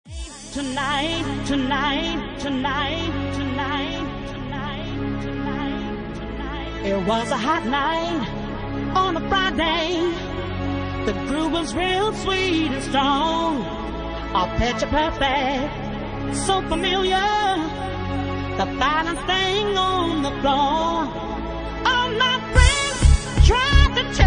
Bassline Mix

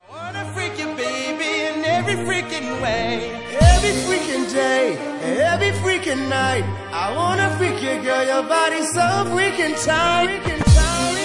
Bassline House Remix

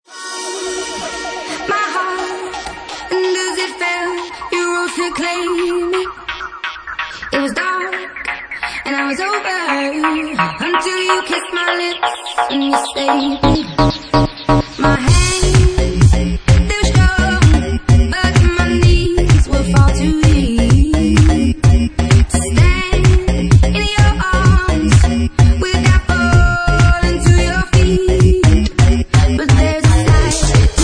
Electro Garage Remix

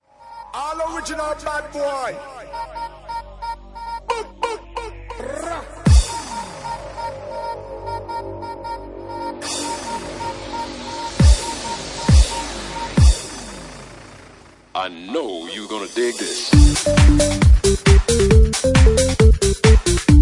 Organical, Bassline House

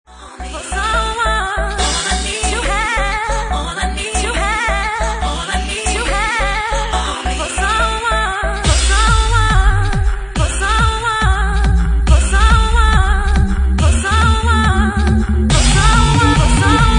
Bassline Mix

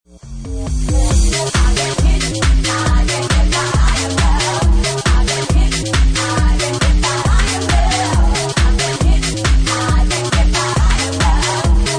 Bassline; Bassline Meltdown Session 1

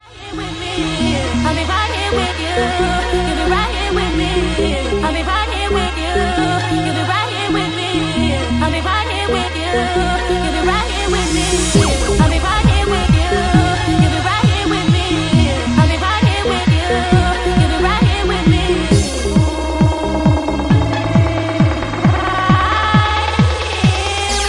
Bassline House Remix

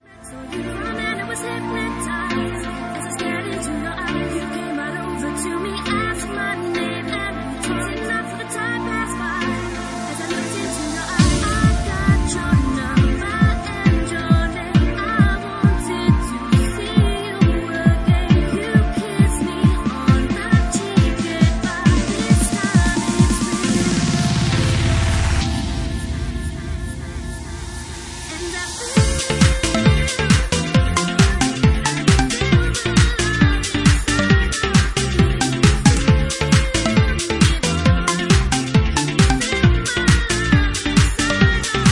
Organ Remix

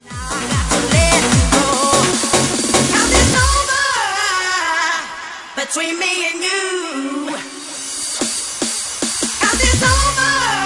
Bouncy Mix

